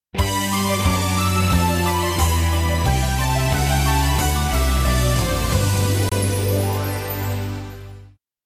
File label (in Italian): Fanfara; SSBB